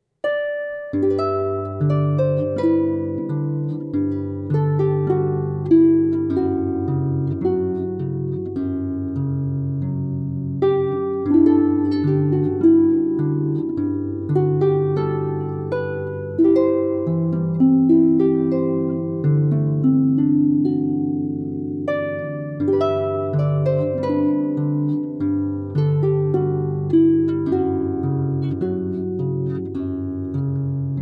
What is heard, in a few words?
Harp